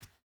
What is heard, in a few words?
footstep boots cloth jump start; Rust